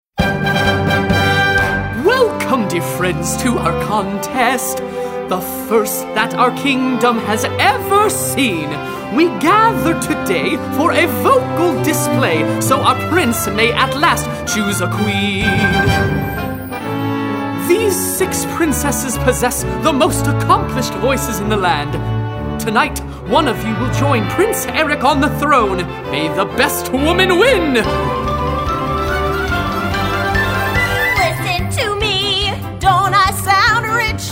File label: vocals